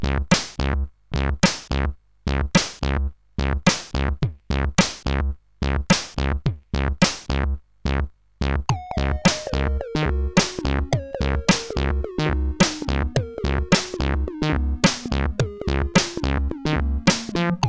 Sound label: Rock n Roll, sid CSG8580R5; Butterfly